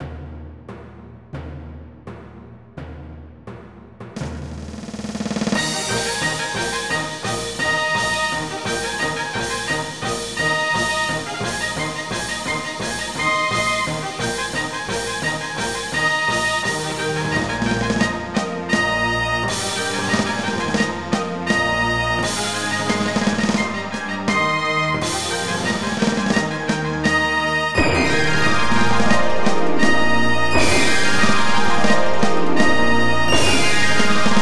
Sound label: fanfare; WorldsChat